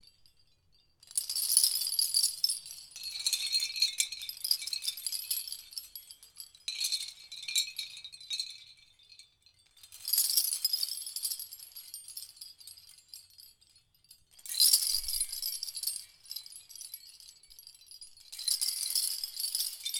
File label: Bells